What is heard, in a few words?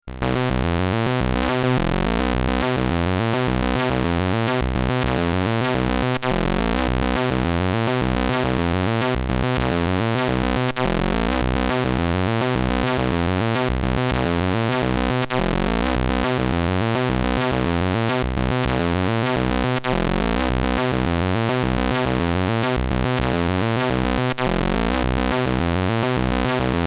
Norwegian blue bass on speccy